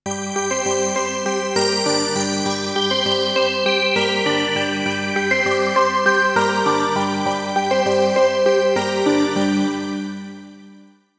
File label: Melody, Akai Pads; Mule